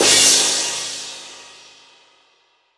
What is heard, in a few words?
dash, Cymbals Electro